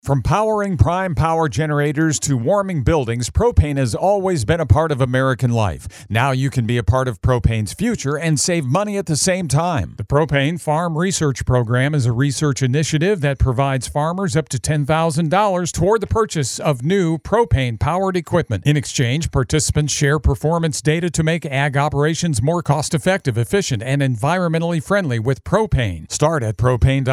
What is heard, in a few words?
PERC